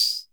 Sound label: Roland CompuRhythm-78, Drums